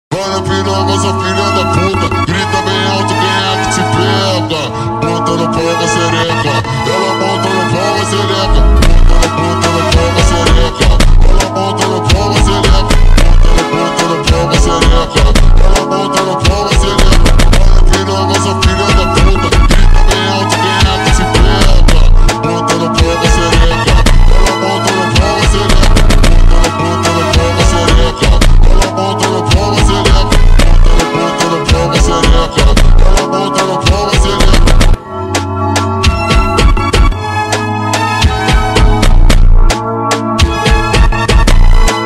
Brazilian funk remix slowed

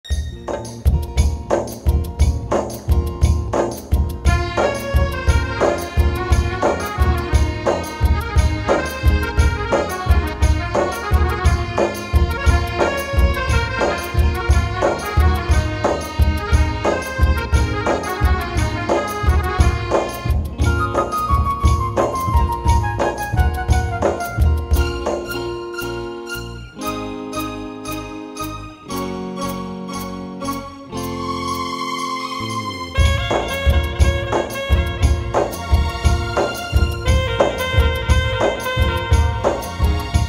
saxophone cover; by lipika samanta

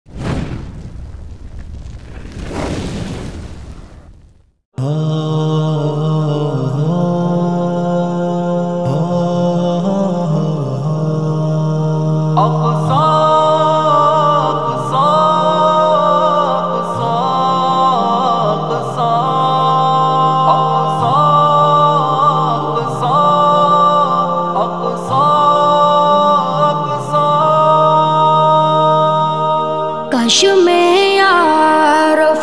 emotional nasheed; naat

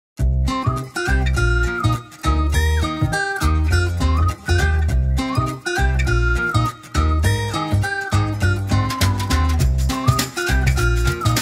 acoustic swing version; fnaf4

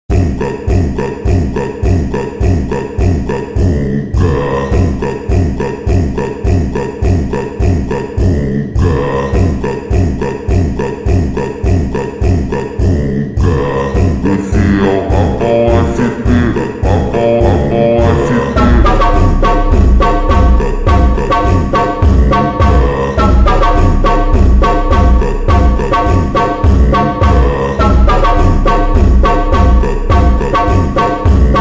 conga montagem slowed reverb

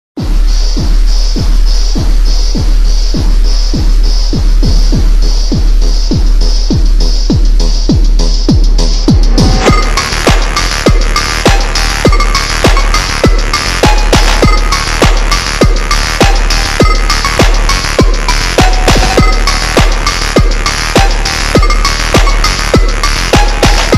slowed and reverb tiktok remix; ada wong leon kenn

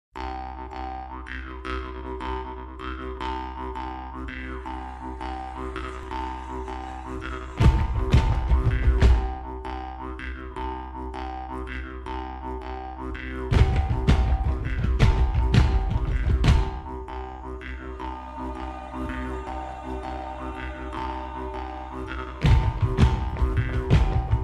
slowed reverb

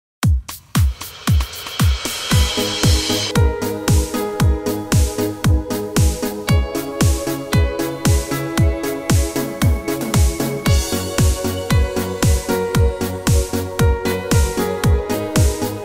italo disco style korg instrumental korg pa4x

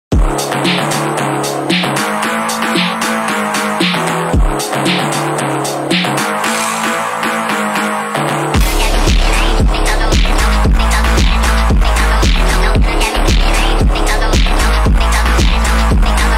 metaphonk

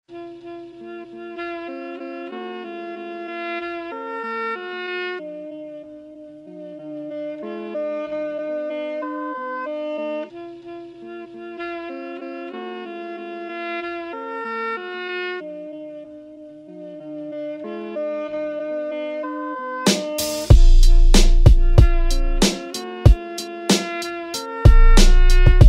boom bap freestyle 90s type beat

